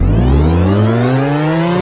Electro FX; UNUSUAL KITS and FX; Ueb HE Electro FX

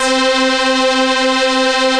Synthesizer Middle C Notes, Lead; SYNTH KEYS ETCETERA

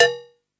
FM Cymbal, harsh; Harsh FM Cymbals

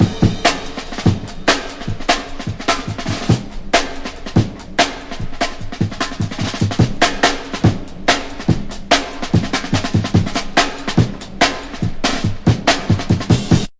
146 bpm jazz, drum loops and breaks; 141 to 160 bpm

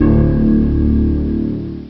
Ominous Note; Cheesy Lo-Fi Sound Effects

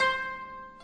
shamisen pluck; Far East Drones and Plucks

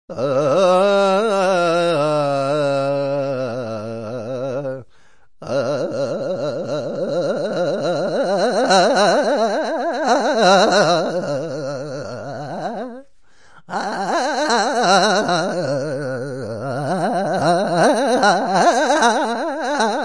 deep india, Indian Singing; VOCALS and SPOKEN WORD

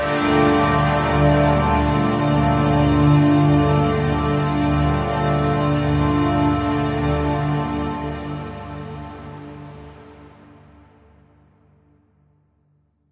one giant moody synth chord big space; Pads

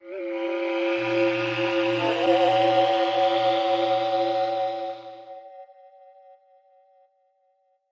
spooky windy musical thing, Atmospherics

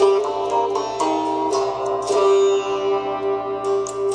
swamp south dulcimer; GUITAR LOOPS

